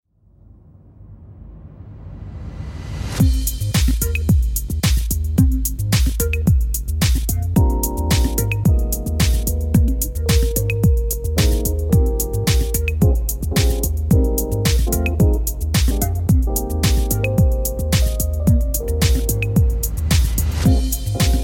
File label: Cool Music